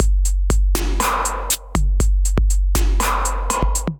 Mixed Kit; Drum Machines; Mixed Overseas Kit; Ableton; Factory Packs; Drum Essentials

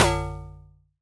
Alien FM Perc Short; Ableton; Noise Garden - Augmented Percussion; Alien FM Perc Vol. 1

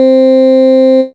Wave, Digital Synths; Ableton Foundation FreePacks

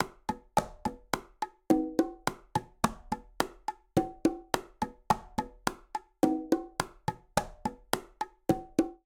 Bolero melodico Bongo & Conga 106bpm; Latin Percussion; Ableton; Factory Packs

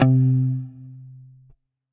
Guitar Plucker; Guitar & Plucked; Ableton; Factory Packs; Drive and Glow

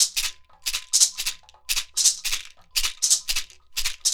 116 African Oparun; World; African Percussion

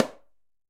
Timbales B H Mute; Latin Percussion; Drum Hits; Ableton; Factory Packs

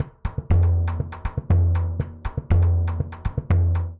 Surdo Cuica Whistle Snap; Latin Percussion; Instrument Kits; Ableton; Factory Packs

